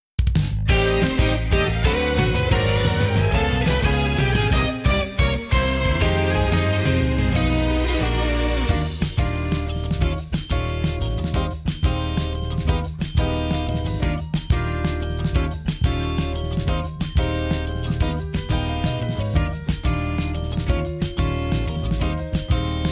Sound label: inst